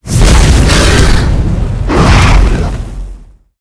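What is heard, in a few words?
spawn, monster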